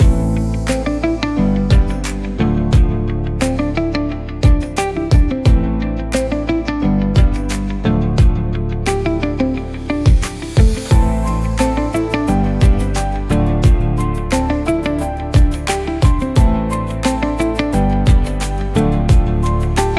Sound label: Pop